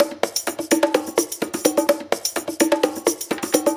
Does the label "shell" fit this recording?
No